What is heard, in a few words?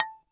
A4, Yamaha VP-1; Music Store; 90's Sample CDs; MIYABI